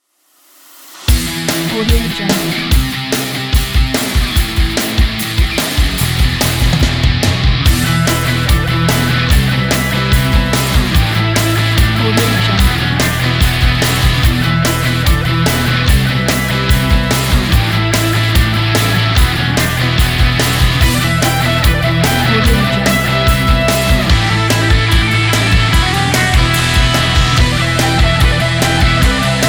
fast action rock intro

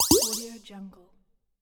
8bit point

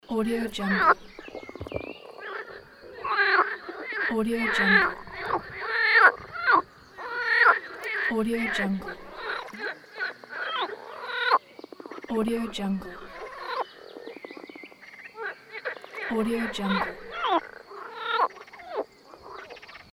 natural frog song around lake

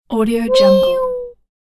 shy cat meow; catsoundstudio

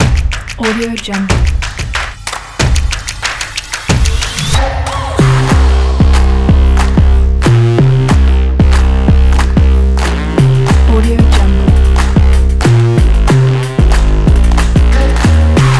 stomp percussion energetic fashion sport

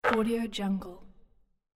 net swoosh

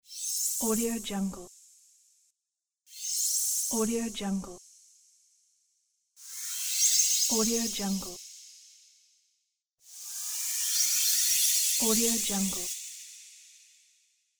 shining

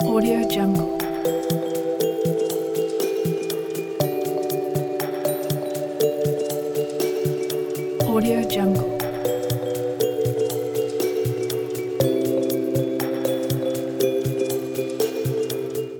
quiz show thinking loop

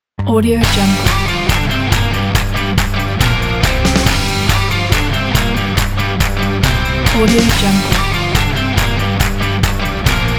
the indie rock

